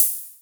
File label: Hi Hats Open; LIMAT Div Dept